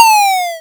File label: lose; Kenney's Sound Pack; Retro Sounds 2